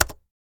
Mech Keyboard

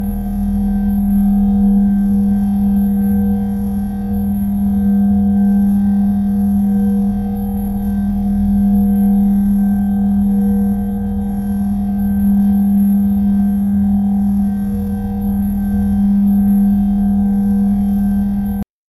Drone Aural Chasm

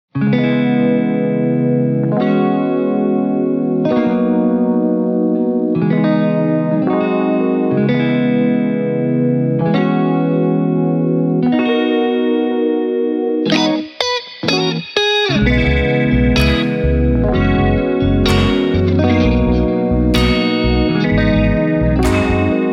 Morning Coffee

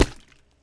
Water Bottle Snare Splash

